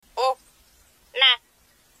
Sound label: tick